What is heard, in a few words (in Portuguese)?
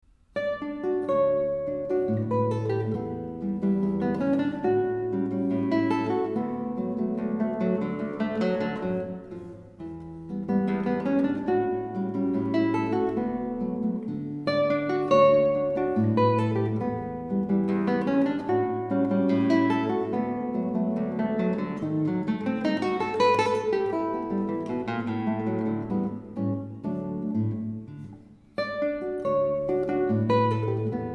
Sons de carrilhoes; South American music for guitar